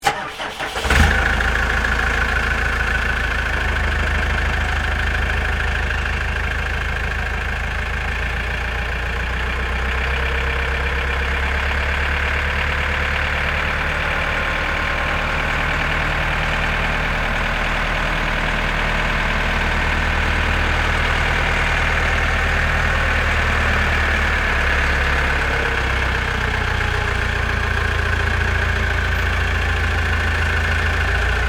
Tow Tractor Away, Industry, Tow, Tractor, Start, Drive, Off, Pull, Into, Hangar, Diesel, Engine; Pettibone; BlastWave